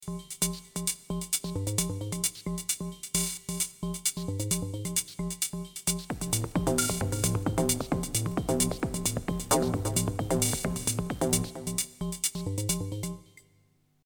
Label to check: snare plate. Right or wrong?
Right